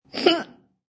Female Muffled Hiccup; Female hiccups; Hiccupping Sound Effects; Sound Effects:Human

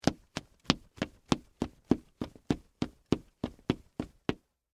Dress Shoes Run, Concrete, Short; Footsteps

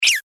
Squeaky Kind Of Noise; Squeak Sound Effects

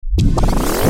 Sweeper Ascend; Production Element Imaging Element Accent Transition; Ascending Sound Effects

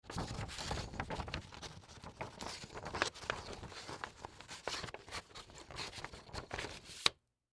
Manila Envelope Paper Put In; Office; Materials; Blastwave

